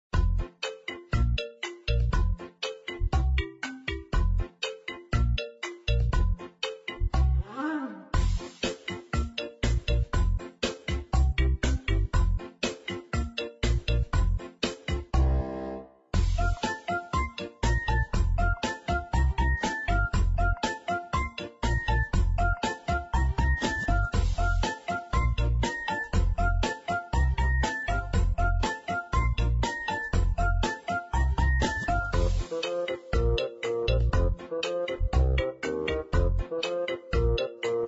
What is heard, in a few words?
instrumental happy music